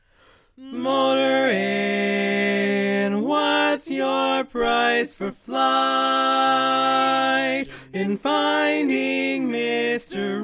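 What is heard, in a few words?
Baritone, barbershop tags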